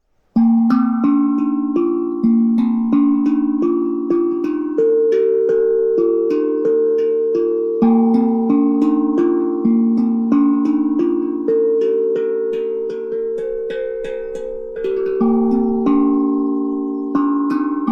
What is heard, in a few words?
handpan